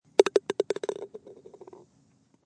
Golf Ball In Hole, High quality stereo recording of a golf ball dropping into and rolling around; High quality stereo recording of a golf ball dropping into and rolling around in the hole; Golf Sound Effects; Sports